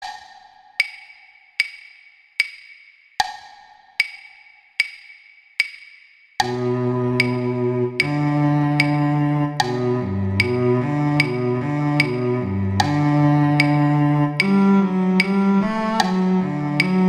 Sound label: Cello; Duets; Duet 10; Cello I; Mozart